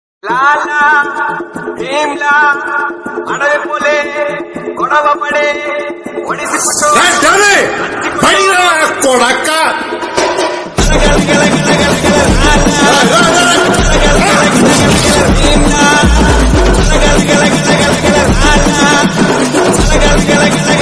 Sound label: Entry Song Ringtone; Bheemla Nayak